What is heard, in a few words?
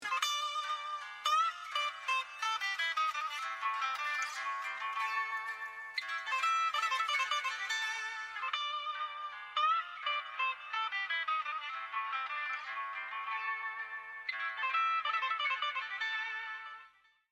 guitar fx; Hip Hop /R&B instrumental